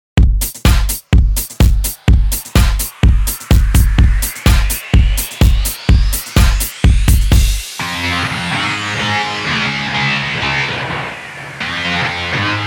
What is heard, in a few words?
Bass